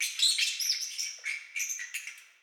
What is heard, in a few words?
ambient; World of Tanks